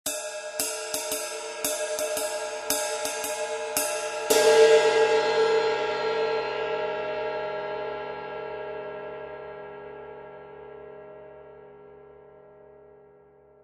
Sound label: 20in Custom Projection Ride, cymbal sound